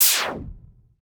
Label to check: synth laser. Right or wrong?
Right